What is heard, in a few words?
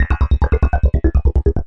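evil electro babble, 143 bpm; SYNTH AND ELECTRONIC LOOPS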